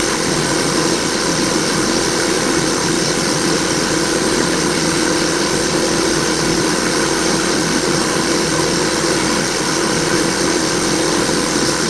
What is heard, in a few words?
blender